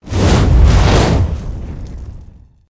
Flame Breath; Evolve; Meteor Goliath

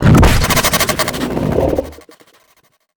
Ability Mimic Start; Lurker; Evolve; Gorgon

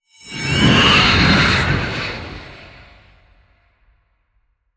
Spirit Walk Start; Lurker; Evolve; Gorgon

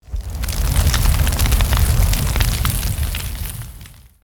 FlameBreath Swt; Evolve; Meteor Goliath

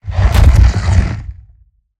Roll Surface End; Evolve; Behemoth

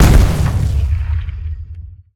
Seismic Blast Fist; Evolve; Behemoth

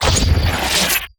Teleporter Warp Blast Explosion; Evolve; Wraith

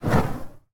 Burning Hands Whoosh; Evolve; Meteor Goliath

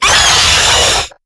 Stealth Attack; Lurker; Evolve; Gorgon

